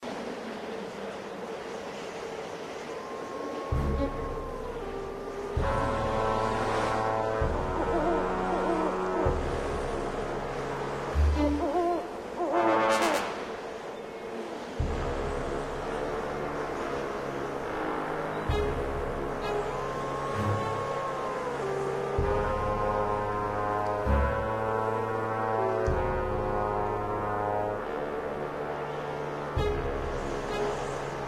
Dark Mountain Instrumental Amb; shieldwall chronicles swords of the north

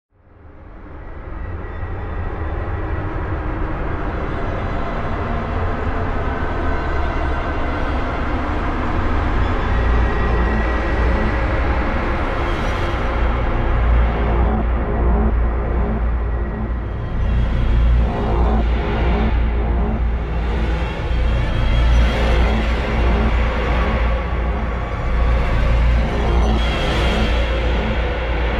Combat Suite Main Ambient; Super Gore Nest - Combat Suite 2 Main Ambient 01

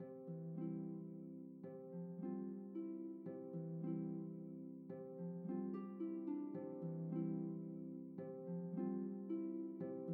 music village; Sentry Knight Tactics

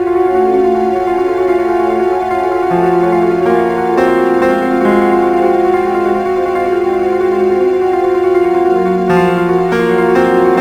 Underwater; The Simpsons Cartoon Studio

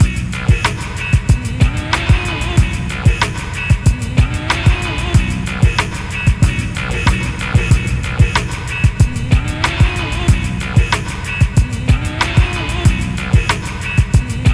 Instrumental; NBA Live 2004; playstation 2

